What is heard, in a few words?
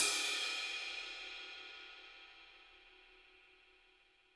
HiHat & Crash, Roland TD-20; Crashes; HiHats